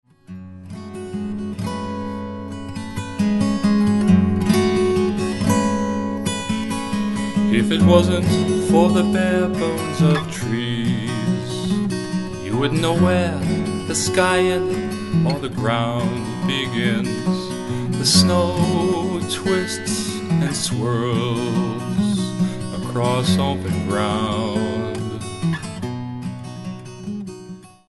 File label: winter heartbeat